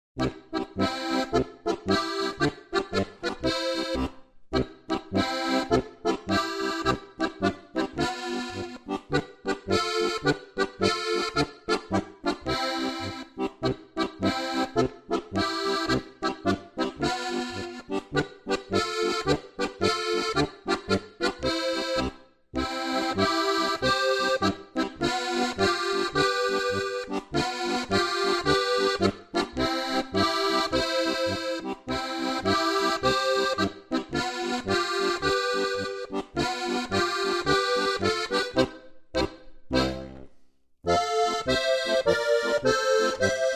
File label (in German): Polka